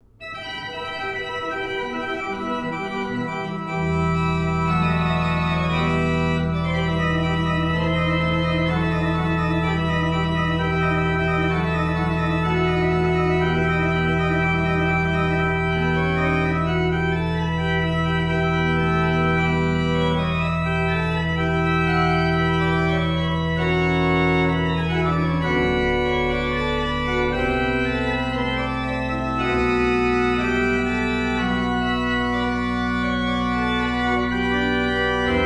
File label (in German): Praeludium in d; St. Anna; Joh. Pachelbel; Schwendi